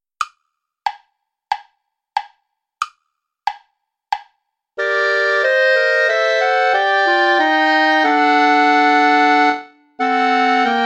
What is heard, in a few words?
play along without clarinet